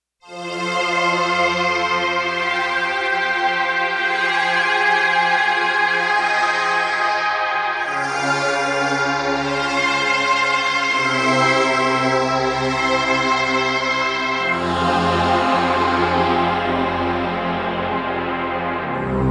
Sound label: Softwave, synth